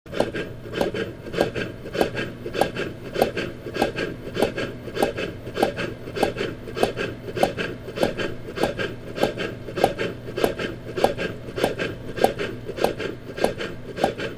Heart Simulator; On, Run Faster Steady In / Out Breathing With Light Bellow Inflate / Deflate; heartbeats